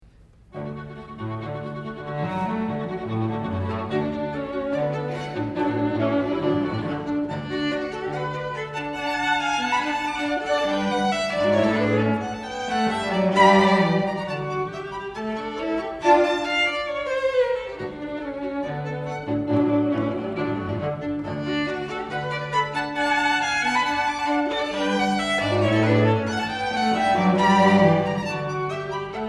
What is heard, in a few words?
Rondo String